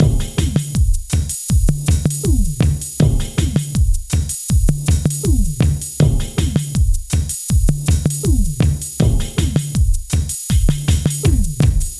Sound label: Techno; Robot Arena